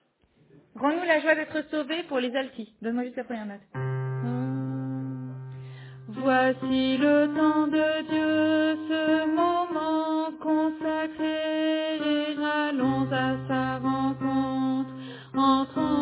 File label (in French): Alto